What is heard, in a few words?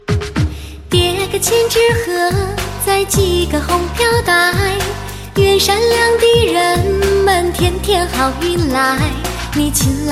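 Chinese New Year Song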